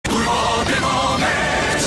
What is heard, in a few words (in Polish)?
Sms Smieszne